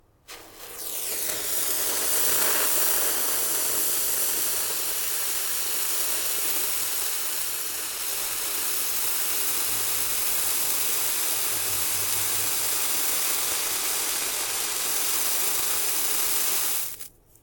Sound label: handy firework